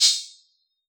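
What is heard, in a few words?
hi-hat Metal Open, Yamaha DJX; 155 DJX Kit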